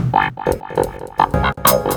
CLAVINET; Ragga & Dub Reggae

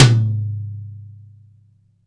MIX TOM M, STUDIO TOM

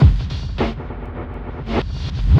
drbreak, drumbreaks; Masterbits; Vinyl Attack

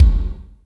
POWER KICK, ACOUST KICKS; Greytsounds

